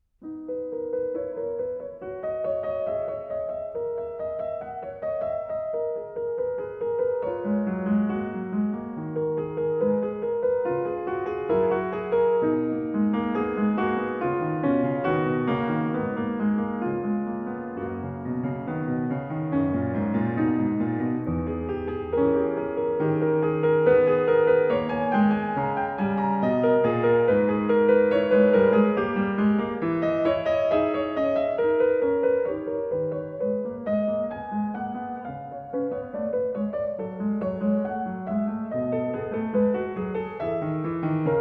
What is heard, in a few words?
Prelude No. 23 in B Major, BWV 868; Bach; The Well-Tempered Clavier; Book 1